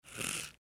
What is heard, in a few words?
bow draw; Keep Out! A Dungeon Adventure for the Brave